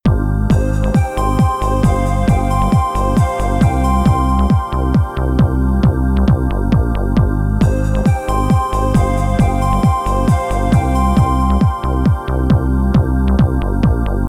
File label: Menu Theme; Mighty No. 9